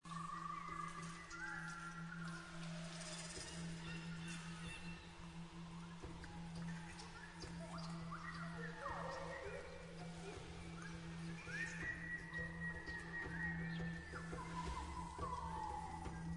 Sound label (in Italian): canto